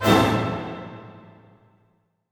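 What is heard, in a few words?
orchestra; Masterbits - Switch FX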